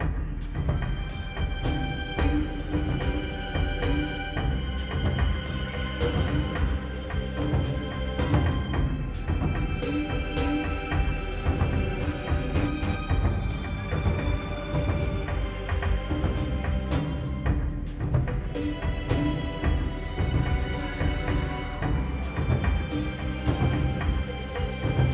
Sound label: Creep Medium